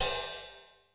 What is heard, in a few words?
DRY CY RD; Crypton; DrumBase5000